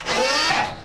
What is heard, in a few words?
hydraulic; gmod; lfs luna s flight school; laatc atte